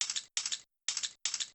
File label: knife spin; terumi